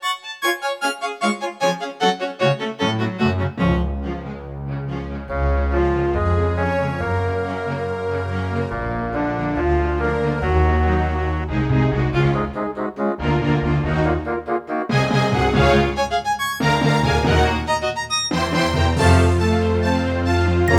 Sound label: symphony